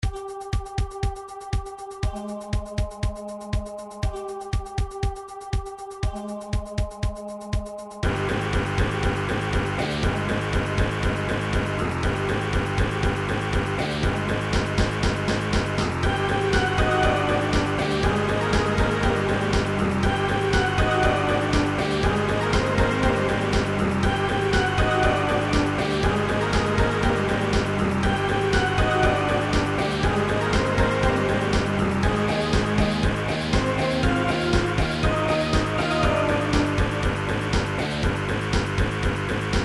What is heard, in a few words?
guitar remix, midi; gameboy super mario land ruins